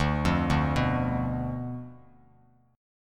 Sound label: D Minor 6th Bass Standard; Dm6 arpeggiated; JGuitar